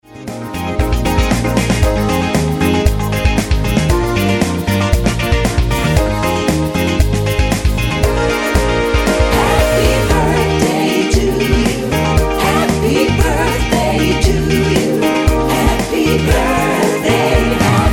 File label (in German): mit Chor